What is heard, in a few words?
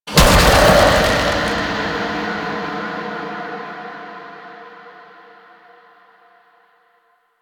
Infested Control Ability; Warframe; Infested Alad V